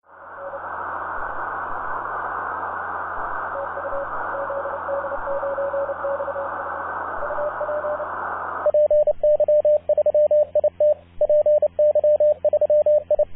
CW, 24MHz; zd9xf

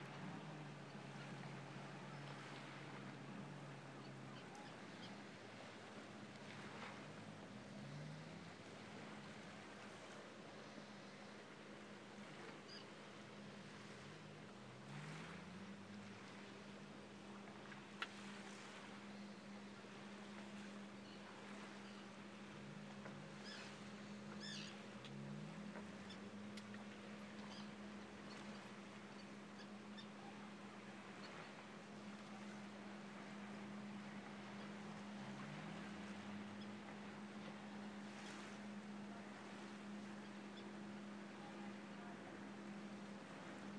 harbour sounds

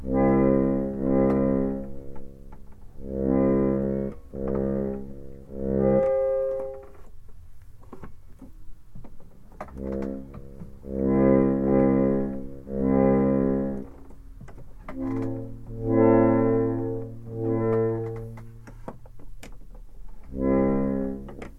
old harmonium in bookbinding; scriveners books; buxton

